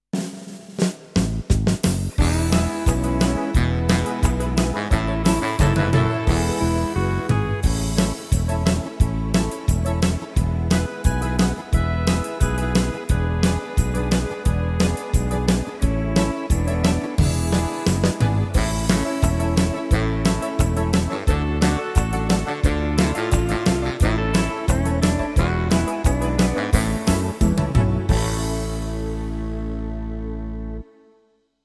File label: Scandinavian Country; tyros2; Dansktop